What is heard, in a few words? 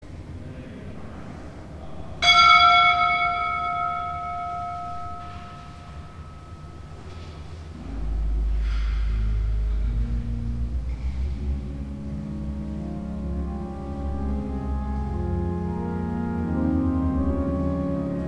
Organ; Ely Cathedral, organ; St. John's Cathedral, Jacksonville Florida